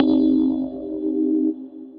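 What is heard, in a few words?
Electric Piano, Synth Keys; Electrix Piano; Ableton; Glitch and Wash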